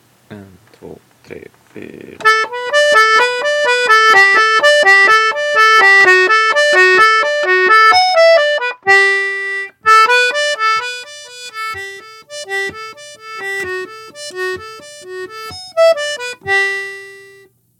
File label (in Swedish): Pakista Stamma C del